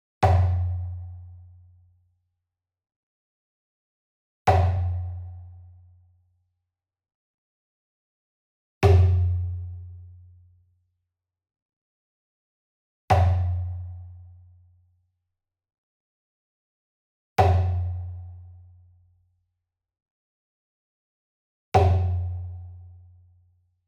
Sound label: Wood Percussion Big Bom Hit Alternative Beater Medium; boom library; Cinematic Strikes Construction Kit